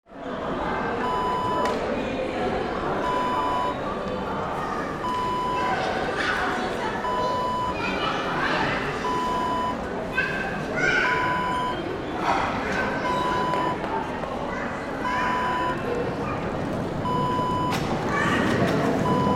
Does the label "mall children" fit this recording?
Yes